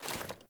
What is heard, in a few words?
Foley Run Default, Clothing, Player